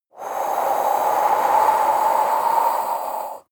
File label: breath female exhale blow heavy